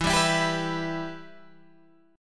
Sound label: E-Minor, Guitar, Open G